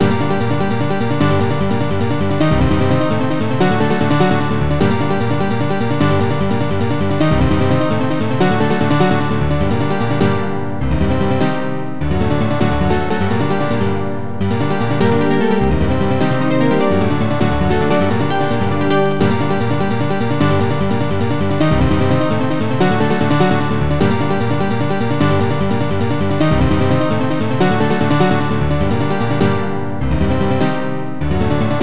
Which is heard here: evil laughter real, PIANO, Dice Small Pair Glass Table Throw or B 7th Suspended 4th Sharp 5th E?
PIANO